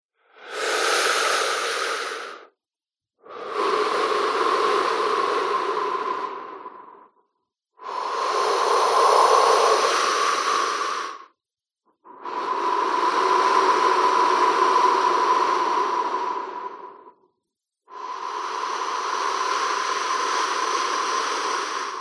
human breathe in out deep slow

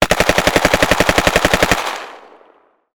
gun ak 47; still north media

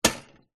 household rice steamer switch

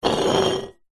food drink can soda empty push slide table

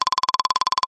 multimedia game sounds toggle clicks

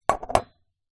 food rice in metal pan set down on wood; zapsplat

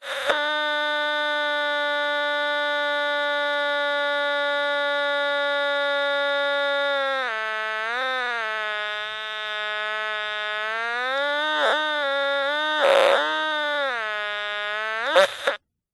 cb radio plasticy static feedback whine; zapsplat

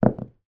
2 dice throw on carpeted floor; foley

